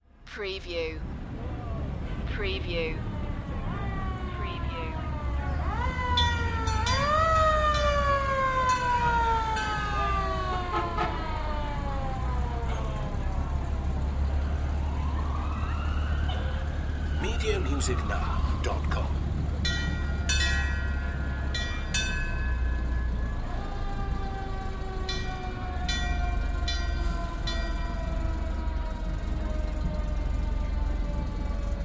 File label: Peaceful City Demonstration